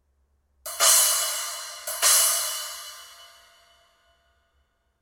10in Trashformer, cymbal; ZXT

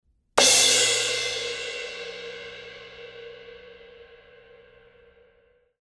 K Con Crash Ride Crash, cymbal

